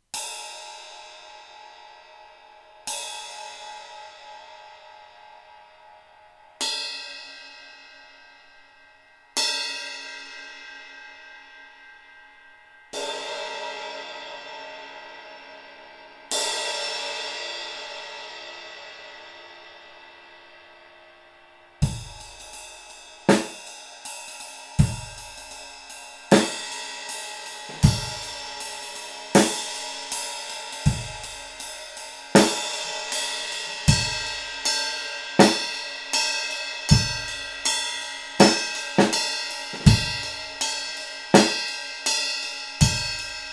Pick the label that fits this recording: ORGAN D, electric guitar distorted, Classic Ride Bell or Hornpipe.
Classic Ride Bell